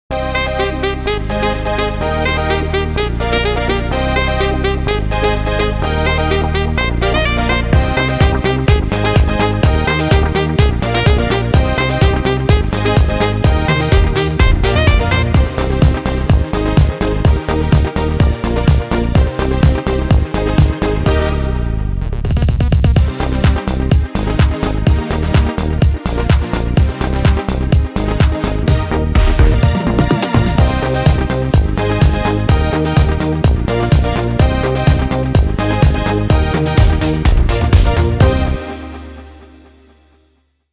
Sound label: Sax & Dance